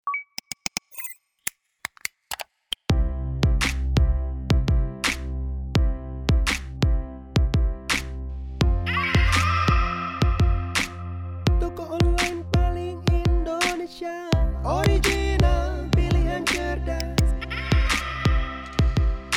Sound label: Karaoke jingle